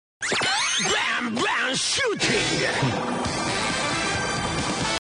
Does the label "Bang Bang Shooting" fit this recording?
Yes